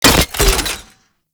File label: Pistol Alt Fire Mech; Weapons; Scaldra